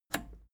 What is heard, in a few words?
plastic button scratch smooth release disable